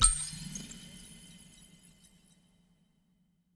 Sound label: reel Spin; wilderland